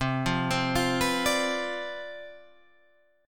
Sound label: C Major 7th Suspended 2nd Suspended 4th, Guitar Open G, chord